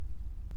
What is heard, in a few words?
Hydrophone